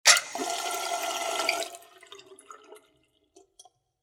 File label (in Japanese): 水道の蛇口をひねる音